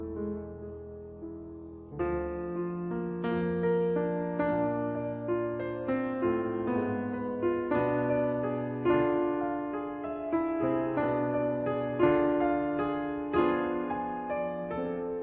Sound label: Fantasie in C major Langsam getragen Durchweg leise zu halten; Op 17; Juana Zayas